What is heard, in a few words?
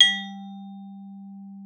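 xylophone G4; Xsample VOL-4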